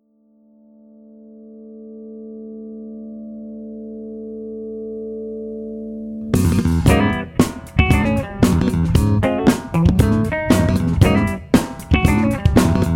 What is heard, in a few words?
The Bass Song